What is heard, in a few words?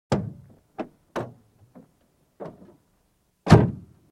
Mercedes Hood Open Close; Cars